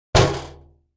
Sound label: Garbage Can Metal Imp; barrels buckets & cans